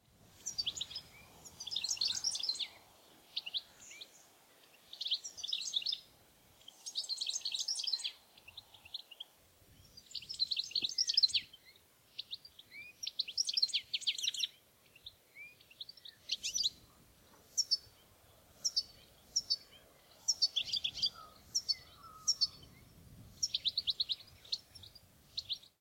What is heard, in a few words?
goldfinch